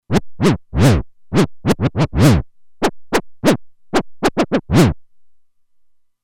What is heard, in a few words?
Scratchin, Roland Alpha Juno-2; Factory 2